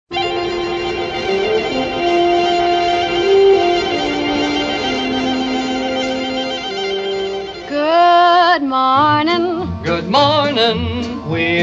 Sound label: Good Morning, carillon; 1952; Allerlei 2004-2005